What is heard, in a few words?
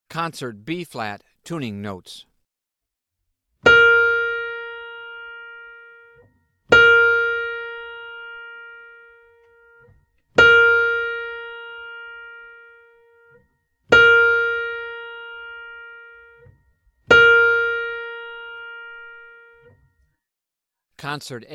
Tuning Notes; James „Jamey“ Aebersold Play-Alongs